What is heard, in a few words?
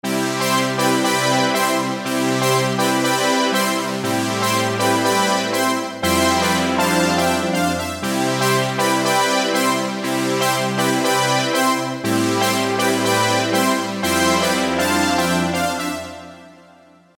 EZkeys 2 Synthwave; EKX Synthwave 3; Nova